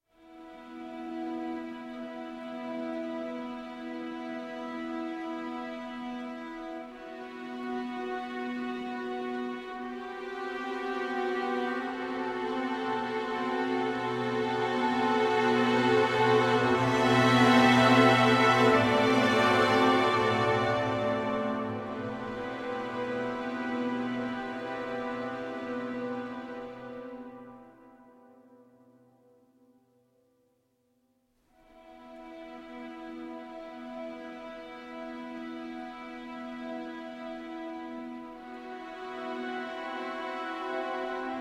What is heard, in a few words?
Strings